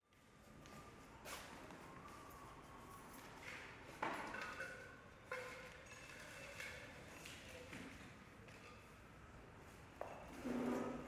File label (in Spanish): TALLER DE ESCENOGRAFÍA HOMBRES TRABAJANDO, UNO SILBANDO; actuación; Gente